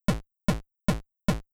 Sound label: Pulse Kick, chiptune